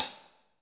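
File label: HH, hi-hat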